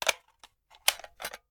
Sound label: Machine Gun Foley; Sound Ideas; Guns